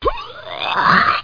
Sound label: hurl